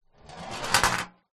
industrial metal ball bearing roll on metal plate surface